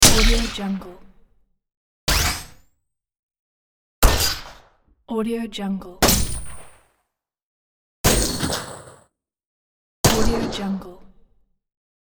designed game futuristic gun shot

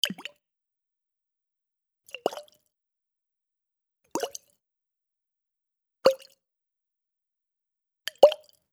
potion

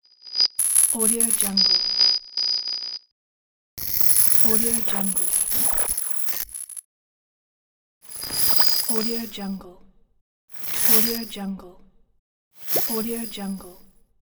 high tech glitch

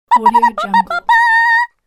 cartoon thin voice fanfare

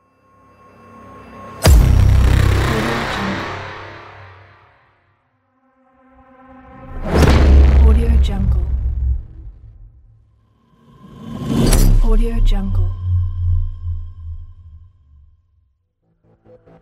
impacts pack

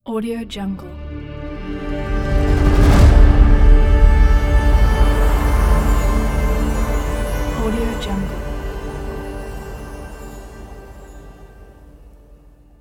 wind logo

